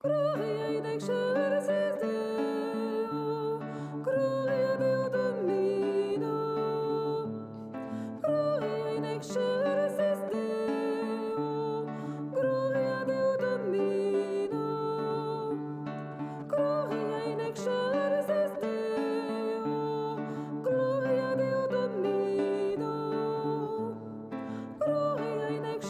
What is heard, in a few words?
Soprano, Chorale